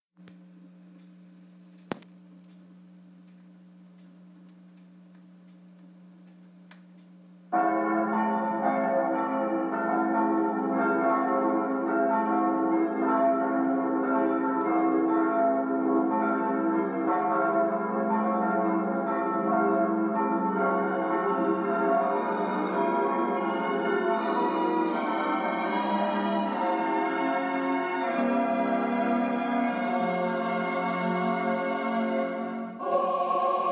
old German Christmas record

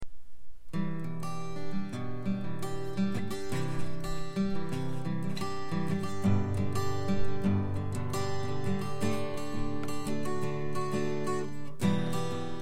guitar playing

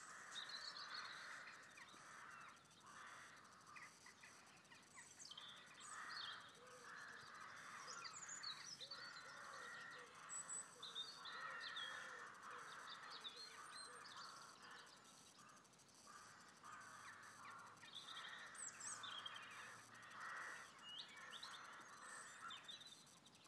birds ambience; Gawsworth Hall

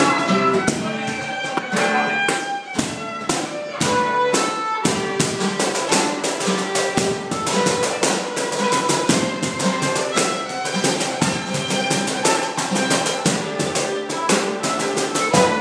post rehearsal jam

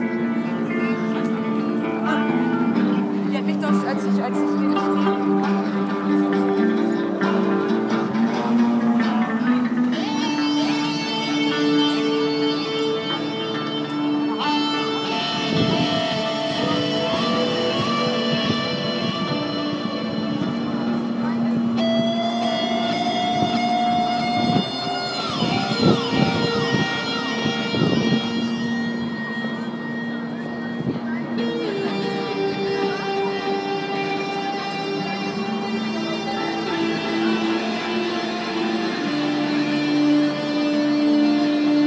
e guitar

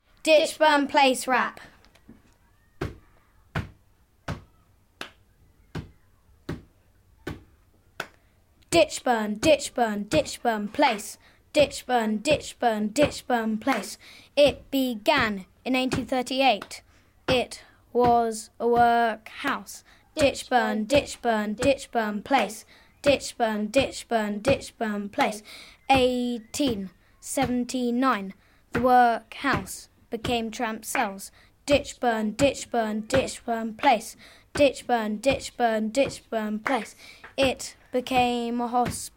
rap

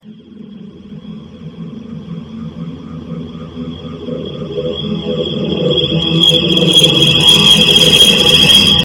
ho hum

